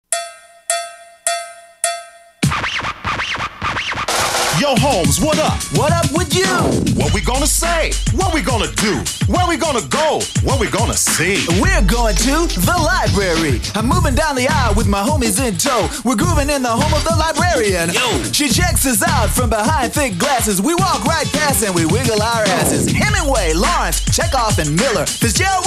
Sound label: library rap